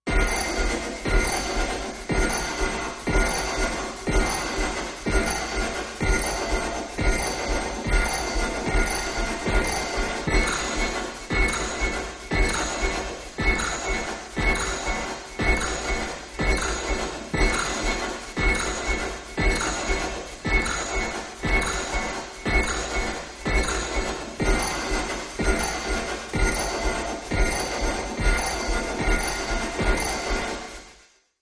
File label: Lie Stamper Machine Pu, machines